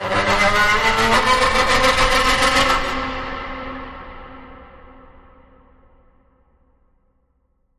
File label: Bigfoot Forest Call